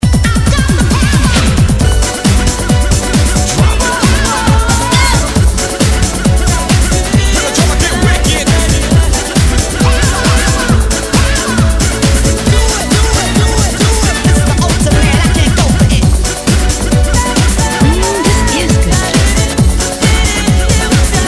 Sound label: Techno Remix Non Stop